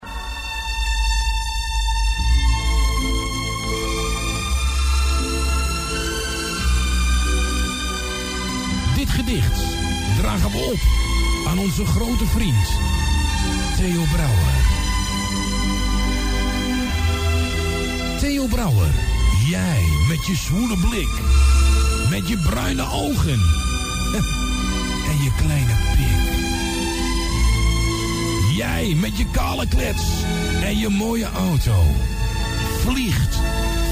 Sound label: Jingle; Audio Fragmenten